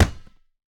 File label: ball hit; fallguys